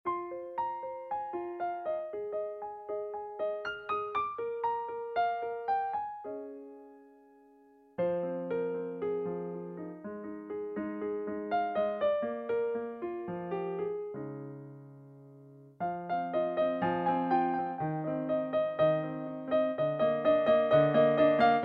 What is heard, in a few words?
Piano Arrangements